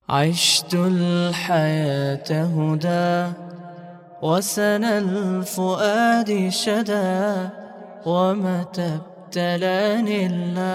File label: Vocals Only, nasheed